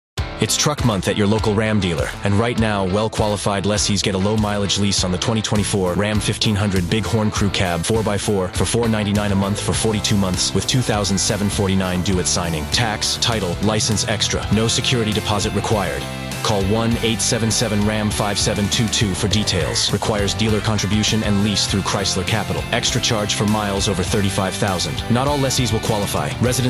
summer hiphop

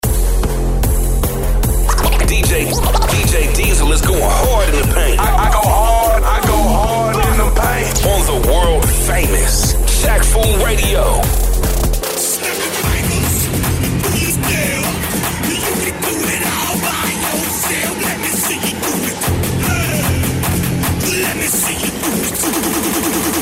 Riddim

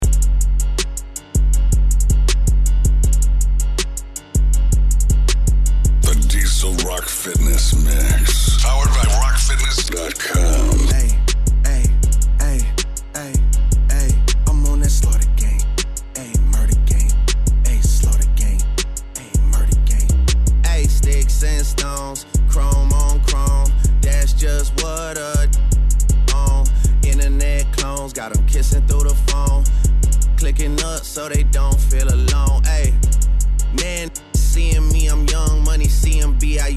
workout mix hip hop

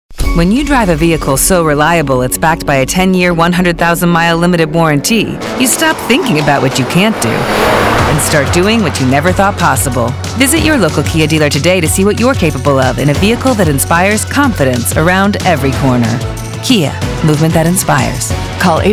Top Floor take over mix

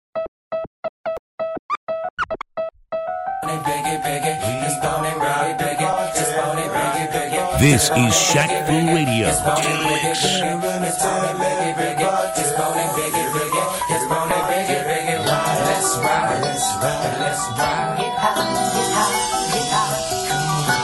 HipHop

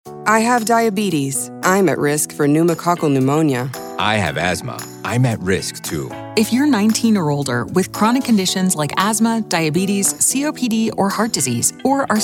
bass

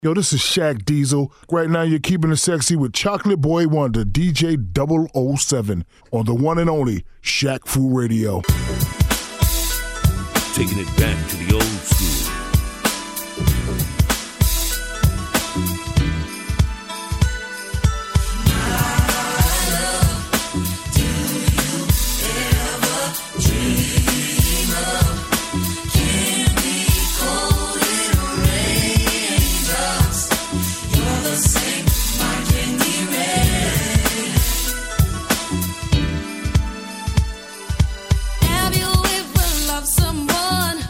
HipHop

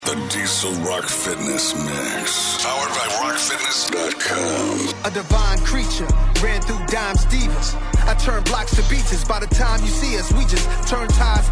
workout mix hip hop

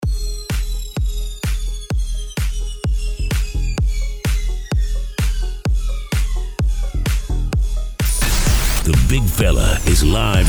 bass

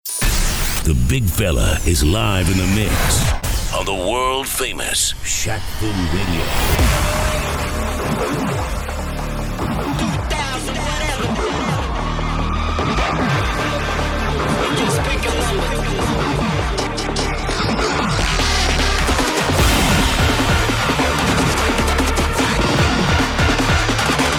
bass

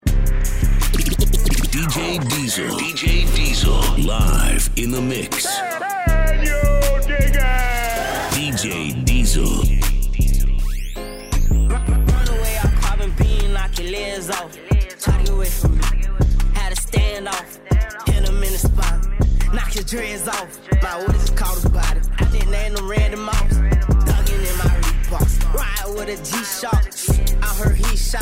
TRAP

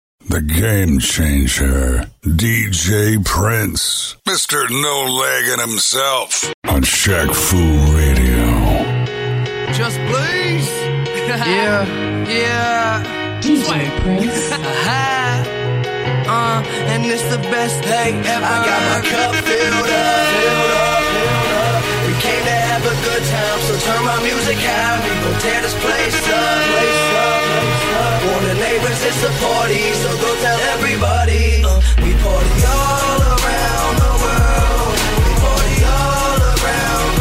HipHop

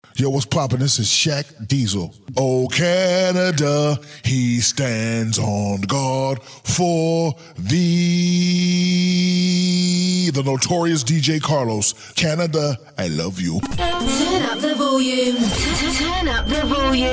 Jazz HipHop

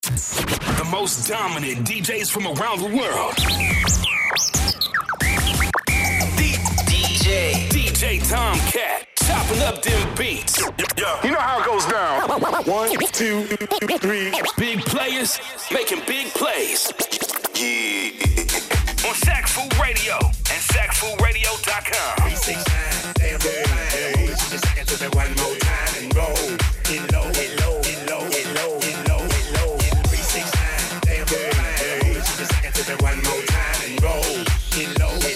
Trap

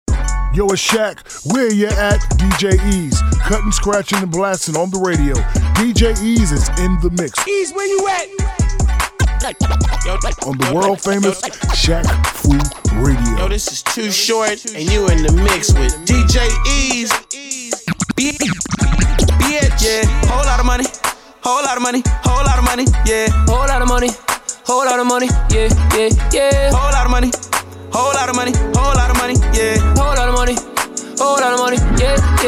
Radio DJ Hiphop

